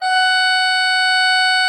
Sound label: F5, Cathedral Organ; ILIO; Propeller Islands